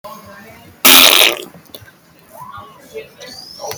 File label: fart; dodo